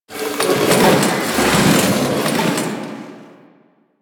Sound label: Intro Chairs; Warframe; The New War; Zariman